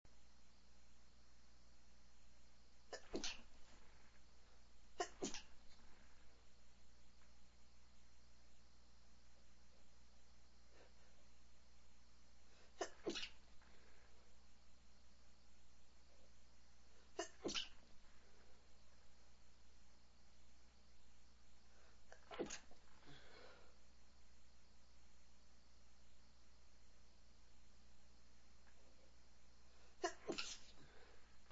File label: stifles, male, sneezes